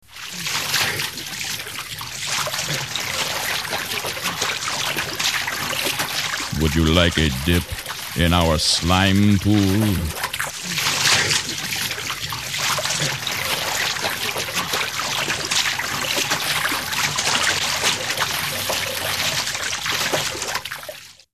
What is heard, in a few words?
Slime Pool; Halloween; Hallmark Halloween 1989